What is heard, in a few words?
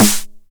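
909 SD Tape, snare drum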